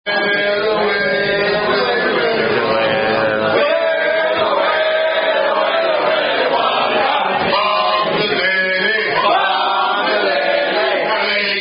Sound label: Rugby Song; Petaluma Leghorn Rfc; Unknown Rugby Song